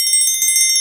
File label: Triangle Roll; Digital Sound Factory Virtuoso